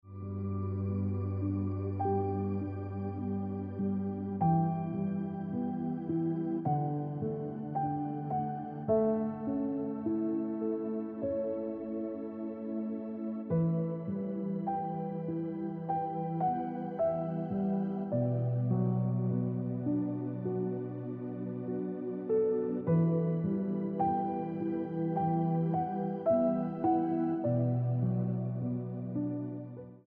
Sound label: Look Back On Ringtone Piano Music